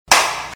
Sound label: slap